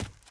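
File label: dirt run, footsteps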